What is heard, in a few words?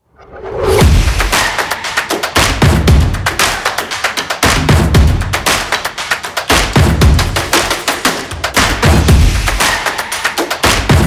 Upbeat Stomp Clap and Percussion Short Version